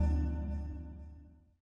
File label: test stereo; VoxelEngine